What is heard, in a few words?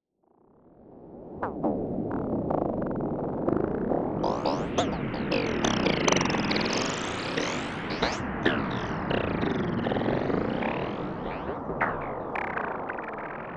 alien sea shore